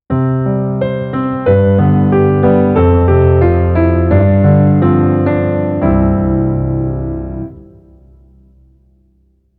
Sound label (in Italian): armonia chiara